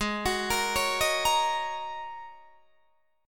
G sharp 7th Suspended 2nd Suspended 4th G sharp Guitar DADGAD